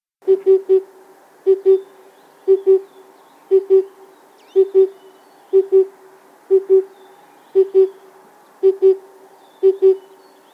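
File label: oriental cuckoo voice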